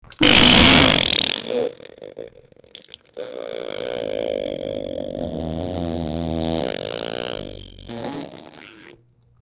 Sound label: extremely loud and hard, gross